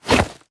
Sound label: melee attack knife; viper